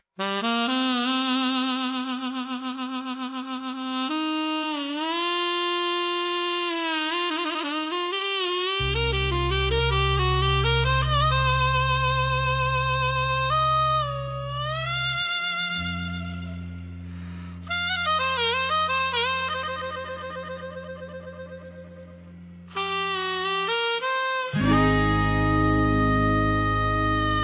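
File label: ukrainian beat, chervona kalina blues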